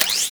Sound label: Rifle Shot Alt Charge, Weapons; Warframe; Zariman Grn Wraith Rifle